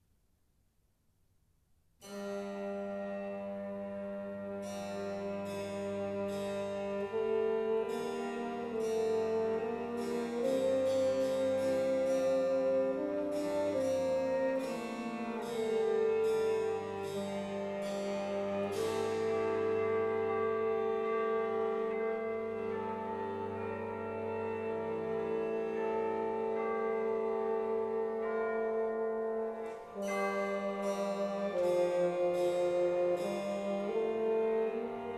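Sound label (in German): Vier Hymnen III. für Violoncello, Fagott, Cembalo und Röhrenglocken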